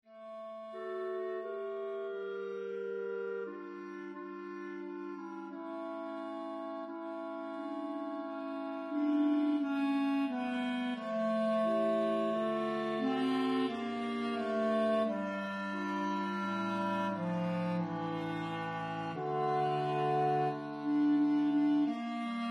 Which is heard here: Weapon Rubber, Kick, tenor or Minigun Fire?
tenor